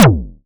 modular pitched, Kickbank; Ableton Foundation FreePacks

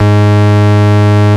Bass G#1; Ableton Foundation FreePacks; Foundation Glitch Toys & LoFi

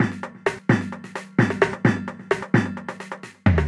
Breakbeat 140bpm, MIDI Drum; Ableton; Factory Packs

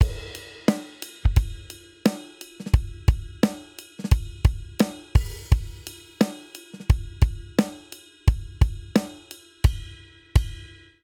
Rolling Hip Hop 07 Outro 87 bpm, drum; Ableton; Factory Packs; Drum Booth

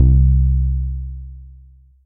Dirty Sub, Bass

